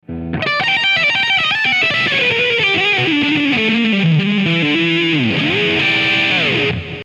Blues Lick, rock guitar; Intelli-shred; Publisher: Alfred Music

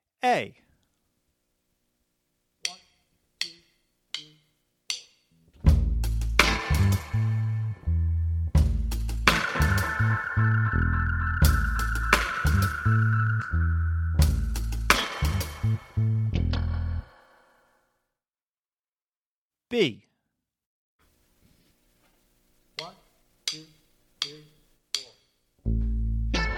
Dub Style Reggae Rhythms, percussion; jazz latin percussion

